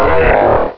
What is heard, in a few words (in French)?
Cri